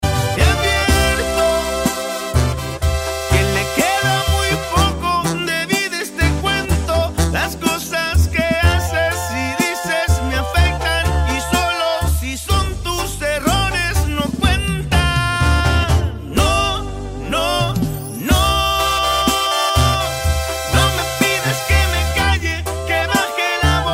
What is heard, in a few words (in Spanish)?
banda sinaloense